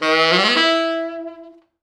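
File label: tenor sax runup, E3; East West; Quantum Leap Horns Sax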